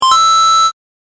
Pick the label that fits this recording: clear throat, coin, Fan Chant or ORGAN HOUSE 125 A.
coin